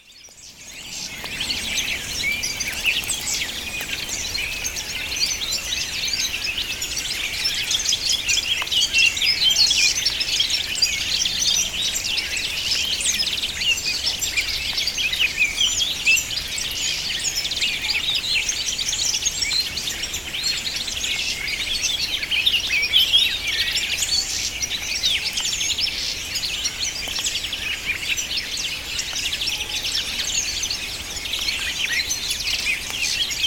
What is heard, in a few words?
creatan birds; Grece